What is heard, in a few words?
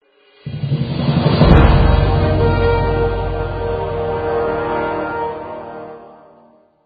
quest complete